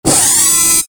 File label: servo agressive